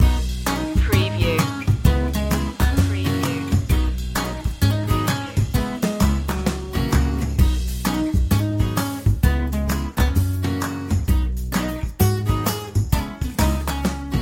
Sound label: i feel alright loop